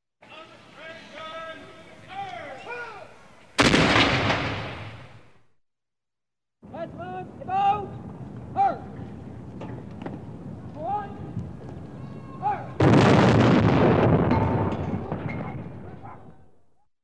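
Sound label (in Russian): звуки артиллерийского залпа команда и выстрел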